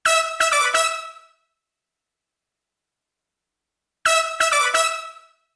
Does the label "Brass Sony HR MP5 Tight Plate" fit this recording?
Yes